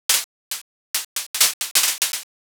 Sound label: firecracker